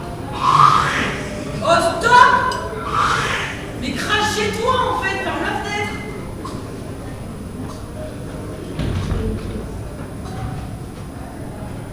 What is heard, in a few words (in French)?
reactions voisinage